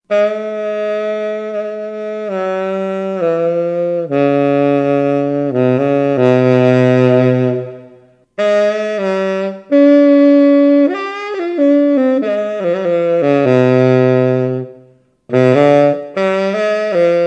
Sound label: saxo tenor; saxo de bambú en Do 3 con pabellón de asta; Sonido de saxo de bambú en Do 3 con pabellón de asta